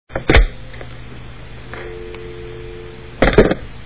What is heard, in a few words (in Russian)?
polozhili trubku posle odnogo gudka